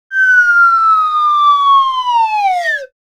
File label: Cartoon falling down whistle